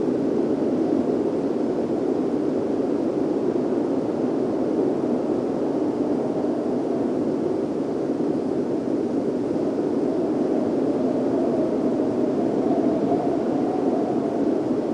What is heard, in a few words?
wind tunnel